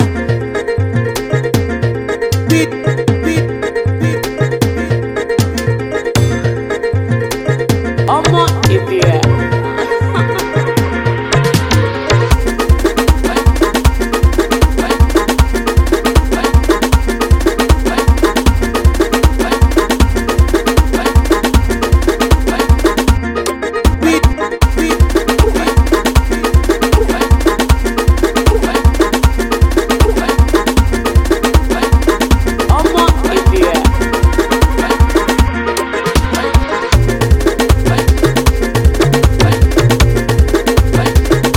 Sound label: Fulani Sound